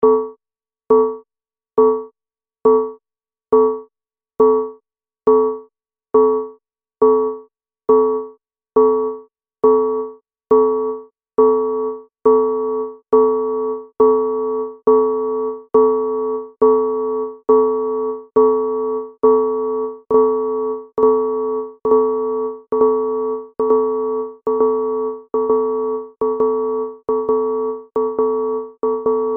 echo gate; tellun

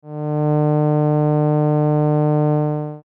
impulse; tellun

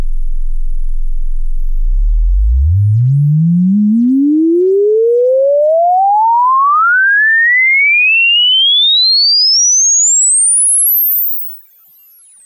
sine sweep

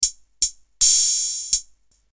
hh, dr110

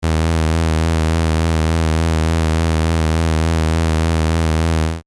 String Filter Voc; tellun

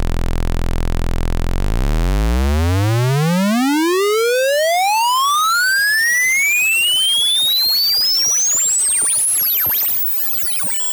square sweep

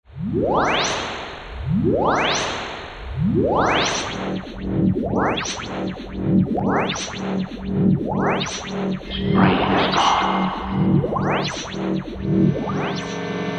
collage; tellun

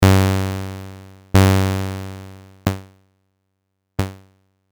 EG response; tellun

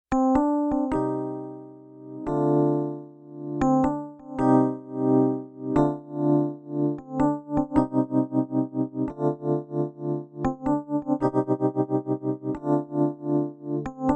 piano pan expo; tellun

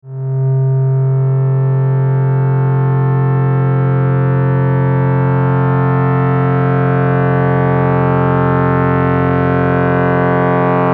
triangle mod; tellun

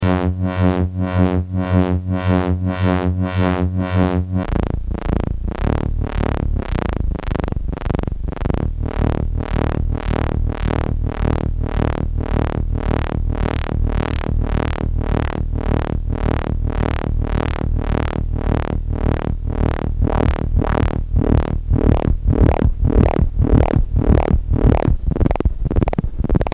panning

